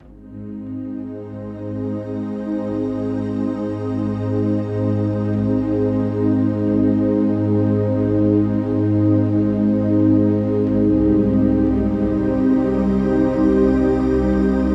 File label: 528hz Shamanic Healing Soundscape